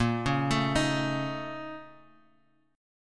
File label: A sharp Minor Major 7th Double Flat 5th, A sharp, down, Bass Standard; A#mM7bb5 arpeggiated; JGuitar